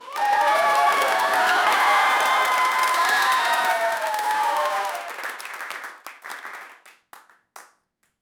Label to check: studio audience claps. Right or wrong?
Right